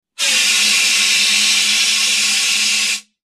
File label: High Pitched Fire Ext, fire extinguisher